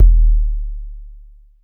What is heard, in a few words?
KICK2; Spectrasonics; Liquid Grooves; LOOP BITS